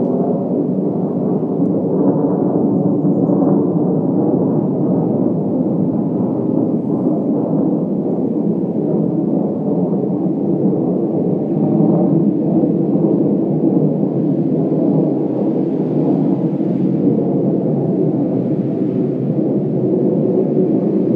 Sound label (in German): kampfjetdroehnen